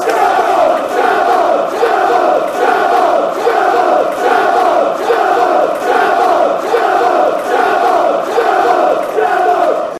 zaaboub fanchants

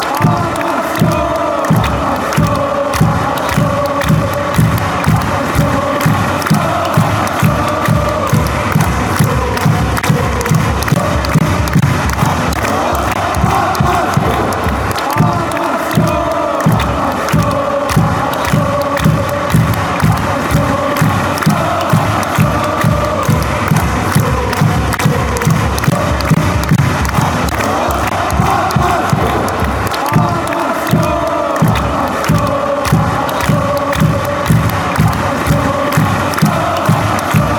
denmark chant

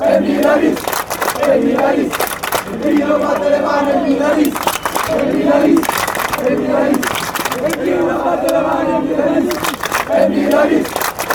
fan chants; from milan

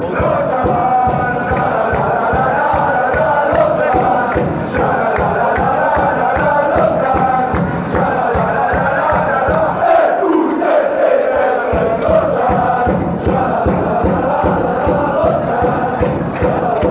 shalalala, chants

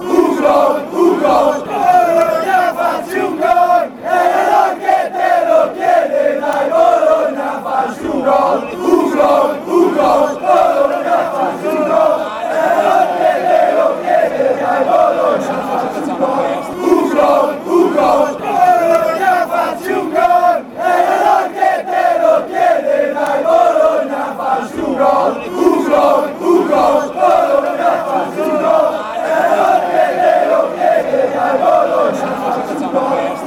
a goal, fan chants

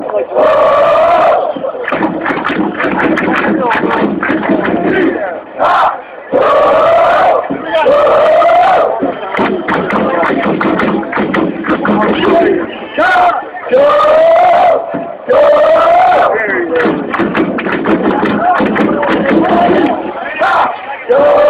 korean chant

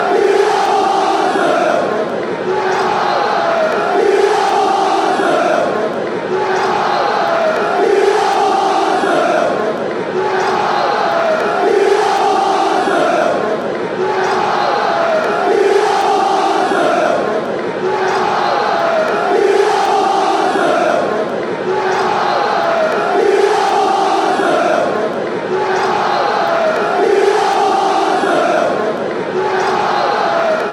shouting, chants; coimbra

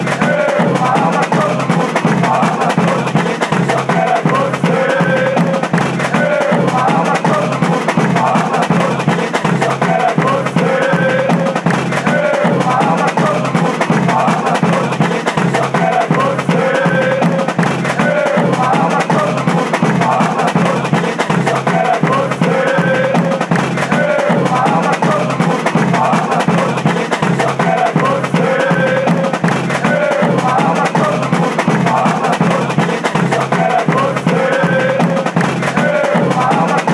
chant, fanchants; palmeiras

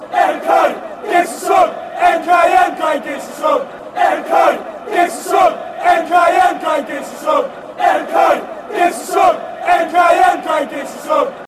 give us song, fan chants

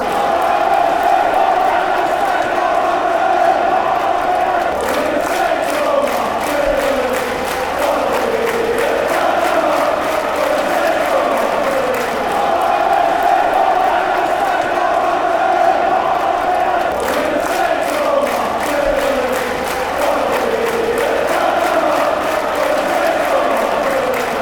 oh when saints, chants

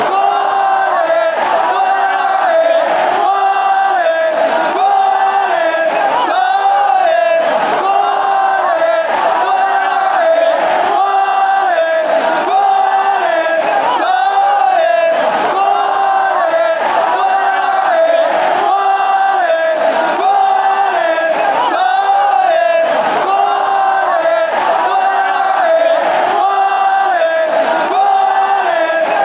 chants; suarez suarez

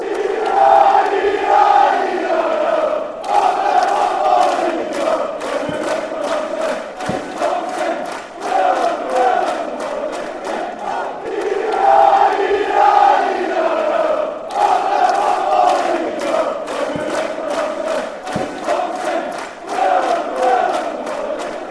eieieio, fan chants; carlisle

